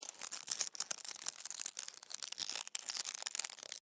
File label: Insect Move Object, Insect, Movement, Object, Drag, Bug; Sound Effects:Animals:Insects; Blastwave